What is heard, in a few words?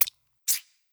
map events choices move with click